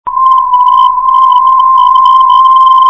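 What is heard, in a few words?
tes 317khz, non-directional beacon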